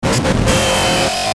Digital Garble Noise; glitches; Sound Design; Blastwave

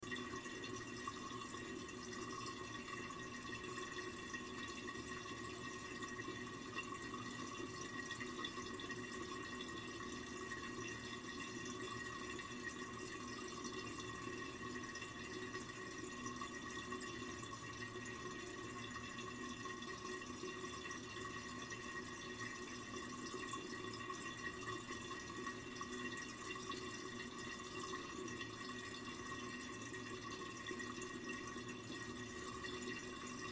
Toilet Run, Toilet Running Water Loop; Bathroom; Blastwave

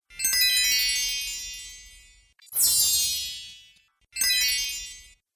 Snowflake Bells; Bell Sound Effects; musical sound effects

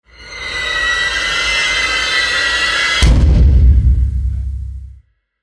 Movie Impact Psycho; Miscellaneous Impact Sound Effects

